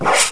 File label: Flash; DragThing 4.0.2